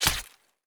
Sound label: knife bayonet hit; Escape from Tarkov